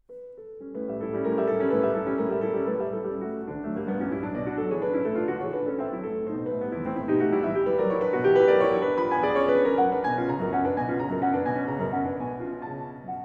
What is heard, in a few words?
Preludes No 05 in D major Allegro molto; Chopin; Twenty-four Preludes, Op 28